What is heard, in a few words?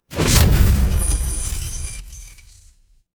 magic scifi portal